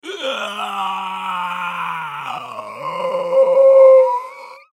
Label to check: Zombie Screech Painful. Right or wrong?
Right